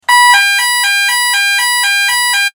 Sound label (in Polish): ton